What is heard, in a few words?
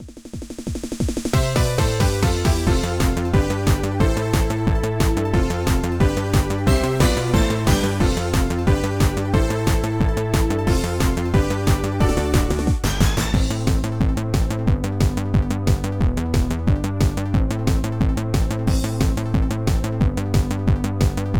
Para-Para Stage Karaoke; Pinky Street Kira Kira Music Hour Pop Princess; Para-Para Stage 4